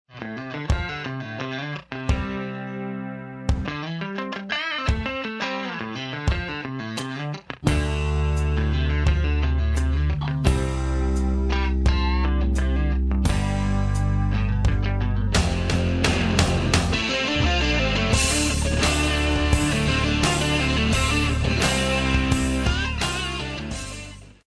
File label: blues